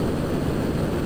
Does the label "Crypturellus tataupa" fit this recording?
No